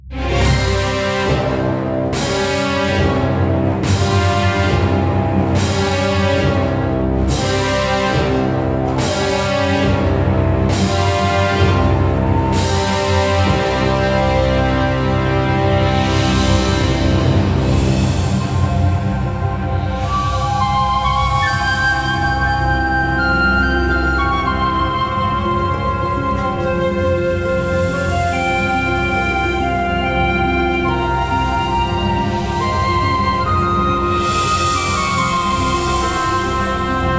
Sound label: BGM Boss; Yozakura Wizard VR